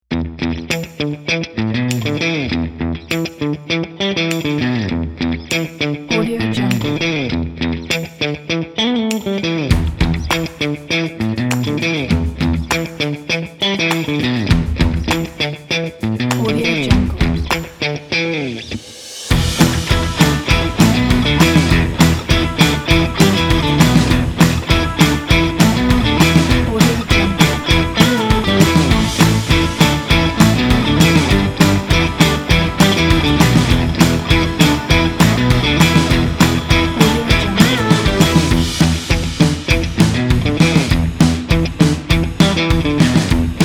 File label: funk